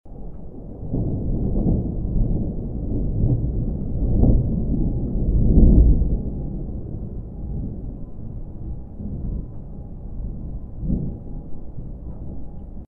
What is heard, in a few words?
thunder; Dystopia; silo